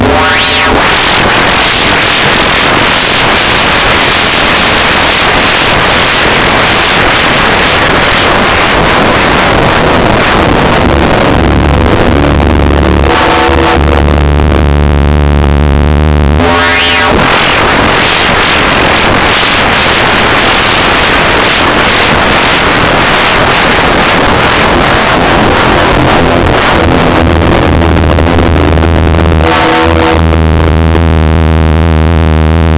victory

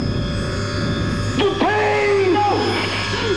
pain

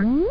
Enemy got gem; Super Maze

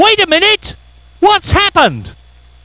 ERROR; F1GP-Theme; dopus; Amiga 8-bit Sampled Voice

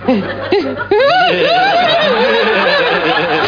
plus

